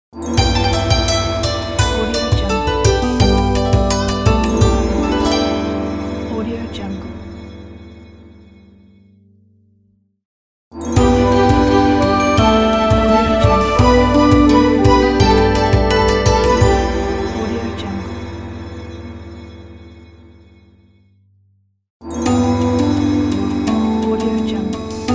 china intro logo pack

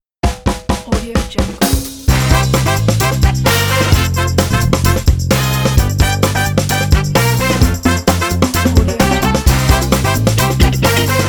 funky tv show logo ident

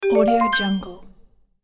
points counter loop

